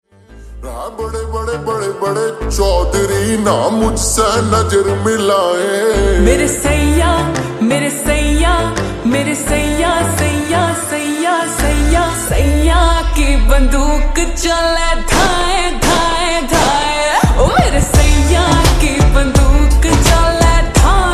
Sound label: Slowed Reverb Ringtone